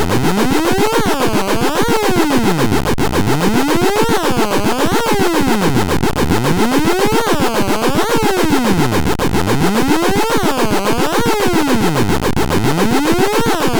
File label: magical 555 vco